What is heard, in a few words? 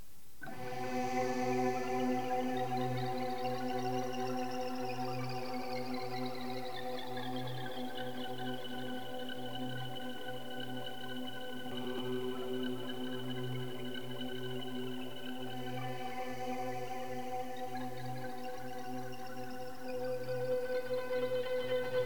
Instrumentals - Ebow Introduction